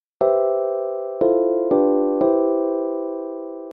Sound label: Jingle